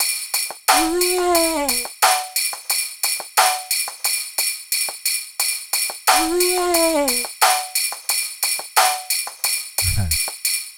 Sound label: PHATT BEA